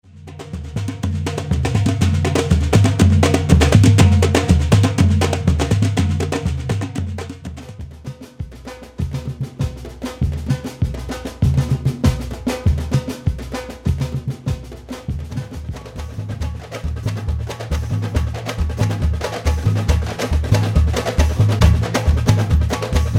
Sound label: Samba Reggae